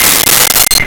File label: Glock C6; Nintendo; July 2020 Gigaleak